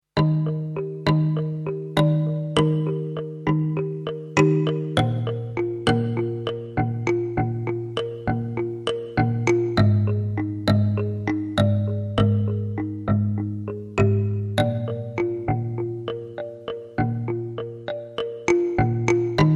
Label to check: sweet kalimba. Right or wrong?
Right